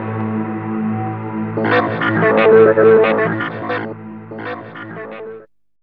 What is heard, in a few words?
DR. LOOP, TRANCE; X-Static Goldmine 3